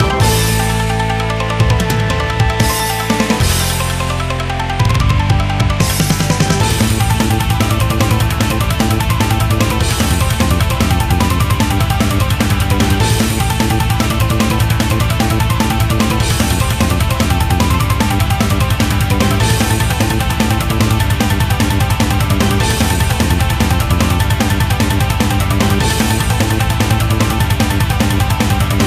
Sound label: Battle loop; otome the exorcist; YAMATO